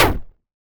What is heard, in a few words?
Stomp Short